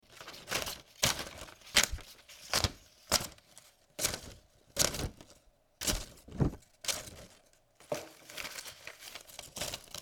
cutting paper

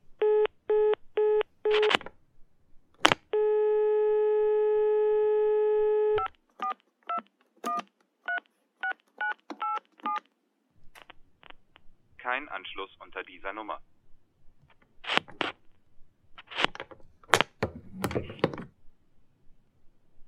telephone tone dialing

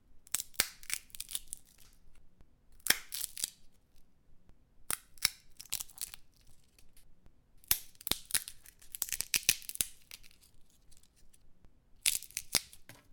cracking peanuts

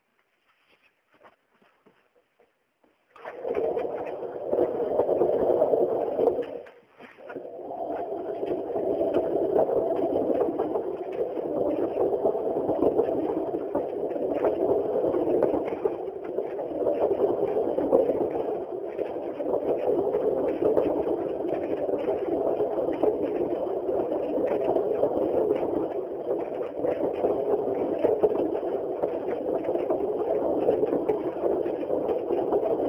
mill

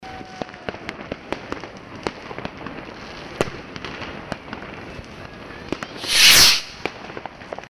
Silvester in Berlin fireworks

